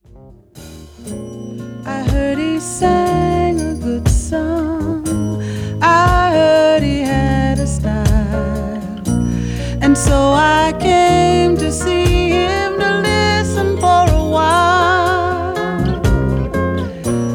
jazz Lady

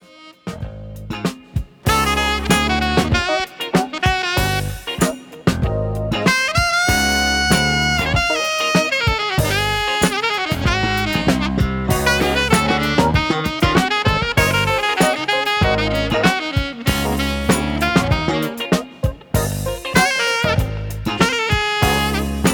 sax laser

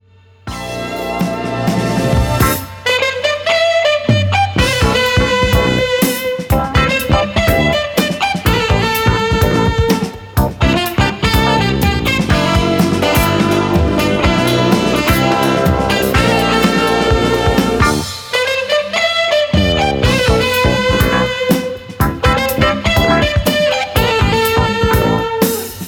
Fusion Guitar

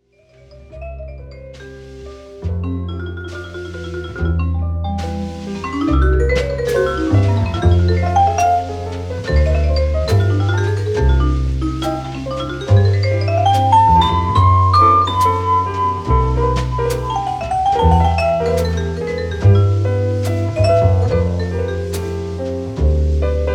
jazz vibra

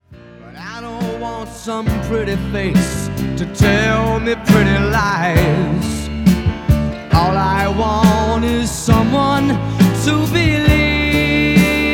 male pops stylus

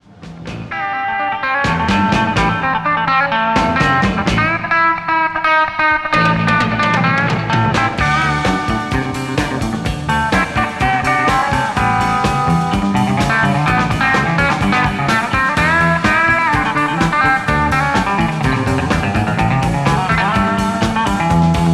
rock inst

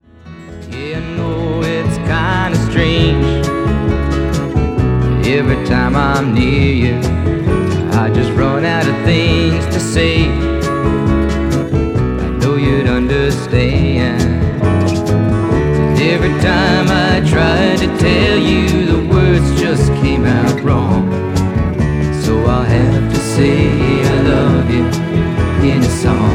Folksong man; Laser Turntable

